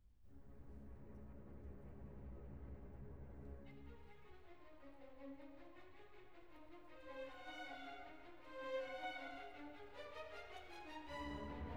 IV. Allegro. Thunderstorm from Symphony No. 6 in F Major; Immortal Beloved; Op. 68